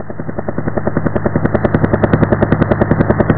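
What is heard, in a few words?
helicopter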